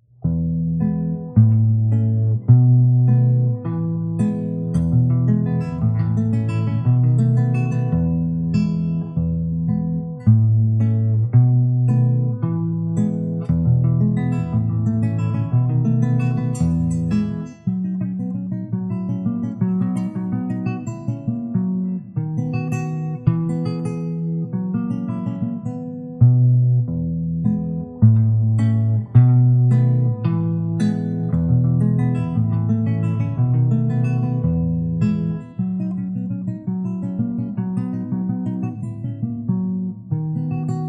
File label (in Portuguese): violao estudo; sor; op60-15